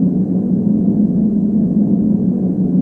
hovercraft engine; There; ThereClient

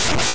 Moon Blade; MMBNMMOP

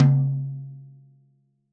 Tom Rack Stick Center, Drums

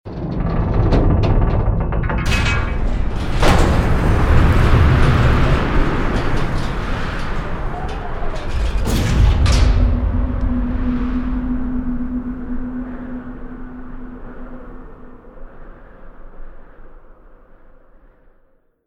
Ruins Gate Open With Distant Shot; Journey

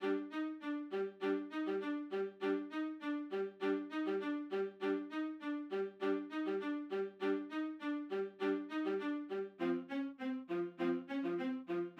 Violas; Sony; ACID Pro 7.0

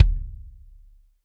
Kick Plastic Open Mid Tuned

